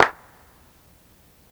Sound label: Tape Clap; Lofi; Cymatics; Lofi Starter Pack